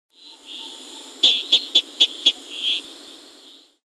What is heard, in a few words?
Heterixalus punctatus